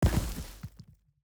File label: environment block digged up